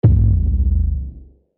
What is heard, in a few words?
thud